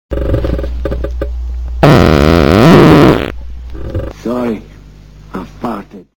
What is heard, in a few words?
fart sorry